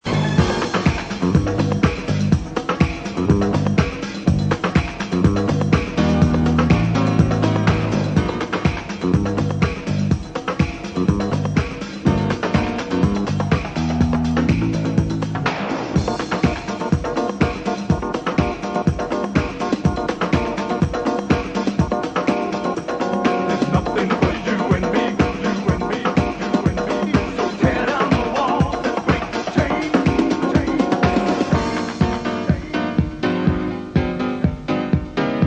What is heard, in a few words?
rhumba piano male